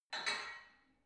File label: barbell only lift up reverberant soft, fitnessstudio